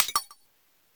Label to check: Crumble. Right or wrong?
Right